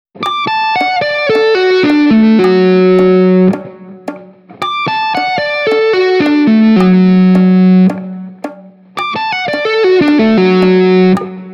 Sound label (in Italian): I Rivolto Discendente